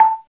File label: Browser Control Feedback; msntv2